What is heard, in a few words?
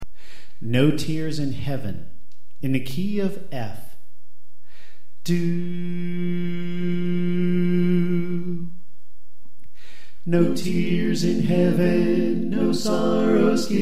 Alto Left